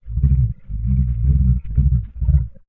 Monster growls and snarls. Creature, Monster Growls, Monster Eerie Sound